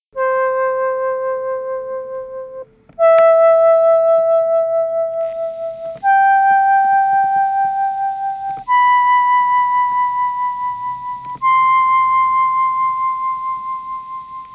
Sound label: song